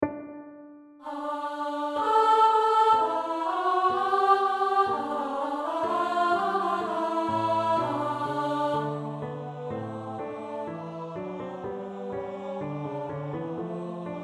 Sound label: Alto; Christe